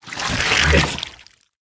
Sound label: Squirming Tentacle Attack